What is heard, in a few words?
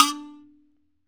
likembe, World O' Kalimba, Earth Tones